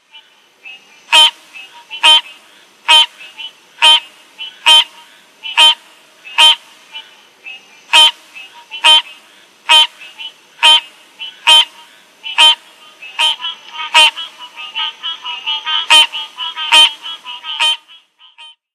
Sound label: Green Treefrog; herps of NC; anurans